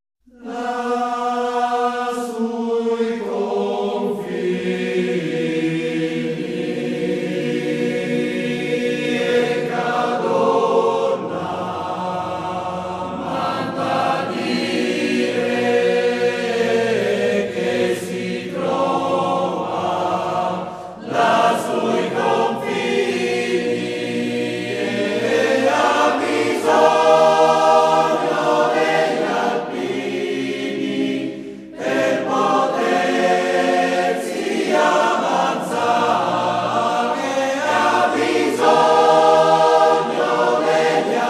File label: choir; E Cadorna; MILITARY MUSIC IN ITALY; WWI